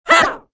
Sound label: attack, spirit; slender; dbd